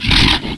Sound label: normal dash, zombie; ZombieDarkness